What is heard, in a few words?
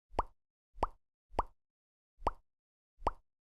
Bubble Explode